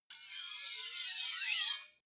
two knives large scrape squeak

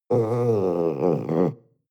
panther young moan

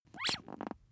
bath duck squeeze

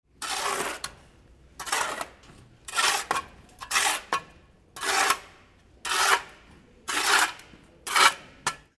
shovel work

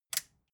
metal button push power off

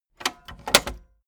tape deck close clatter

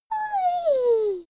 child play fall

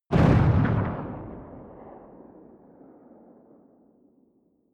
missile small explode distant

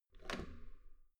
metal door heavy handle up

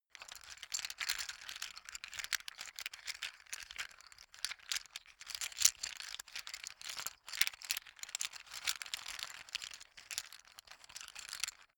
poker chips handle sequence

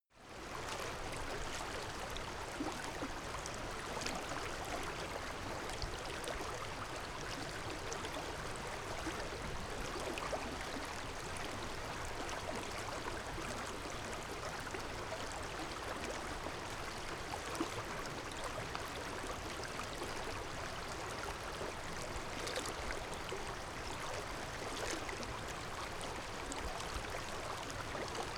stream bubble light

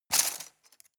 cutlery plastic multiple drawer grab hard

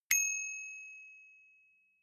bicycle street bell

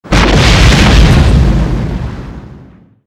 war zone big explosion

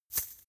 chain mail impact very soft

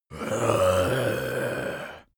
zombie moan idle throat

